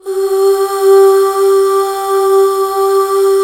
UUHH G4 L, Hallelujah, BACKGROUND; Best Service

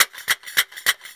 Mizmah, Percussion; Sonic Foundry (Sony Creative Software); World Pop

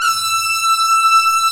LINEAR SYNTH

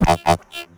Baa Baa Ding; Zero-G; Total Drum Bass; Hits and Bits

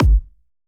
kick layer; kicks

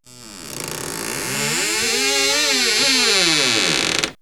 Creaking Door; X-Static Goldmine

